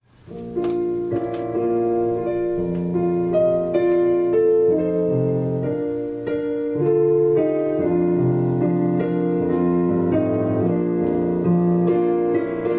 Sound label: Piano prelude